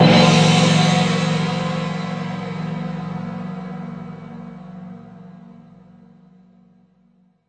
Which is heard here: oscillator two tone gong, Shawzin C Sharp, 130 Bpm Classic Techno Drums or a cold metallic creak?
oscillator two tone gong